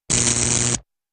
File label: Short Electric Shoc, zaps